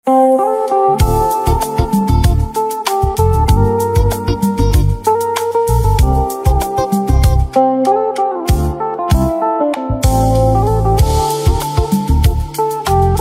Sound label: Guitar